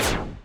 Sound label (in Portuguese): Defesa